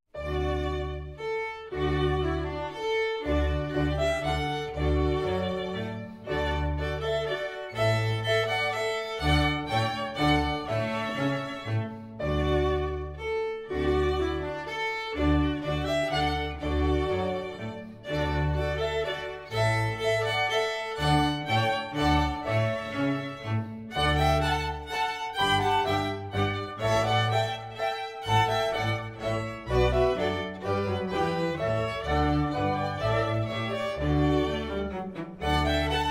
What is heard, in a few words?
Minuet